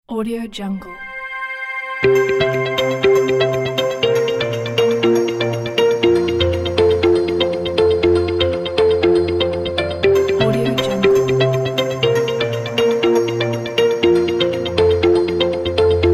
inspiring upbeat corporate motivational technology